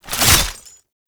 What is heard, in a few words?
Pistol Reload; Weapons; Warframe; Tenno; Temple Signature